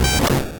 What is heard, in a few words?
8bit se